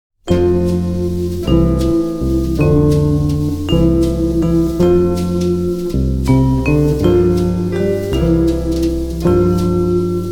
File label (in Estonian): Instrumentaal; Eesti Filmimuusika; Eesti Kullafond 1